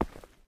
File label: ROCK, steps; Terrarum